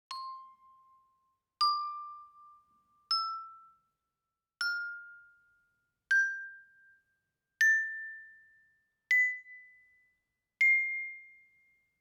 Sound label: bells; SFX Daruma